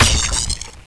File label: shock impact, weapons